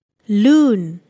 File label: loon